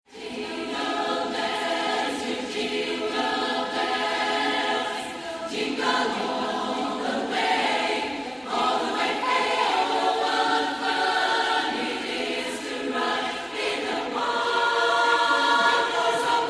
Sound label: Jingle Bells